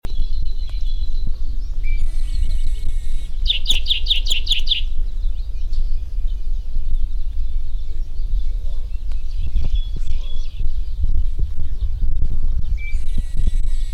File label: medium tree finch